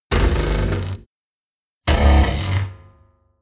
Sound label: buzz